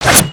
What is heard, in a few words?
Sword Slash; aronwk collection; ndaudio